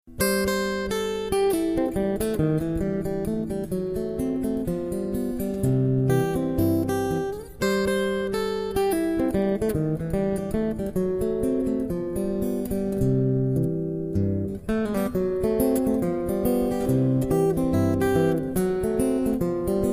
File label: ragtime